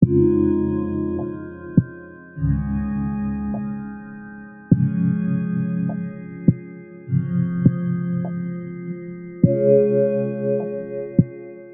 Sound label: Binaural hertz